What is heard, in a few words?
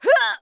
fall; assassin